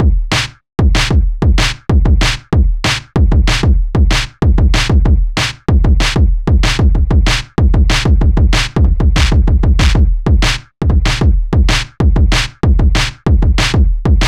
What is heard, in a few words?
Boom Chuck (double); super crush k.o.